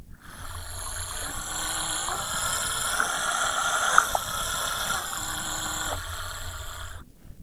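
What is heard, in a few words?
arpeggios breathy, Residual component normalized to -16dB RMS, Western Male Singer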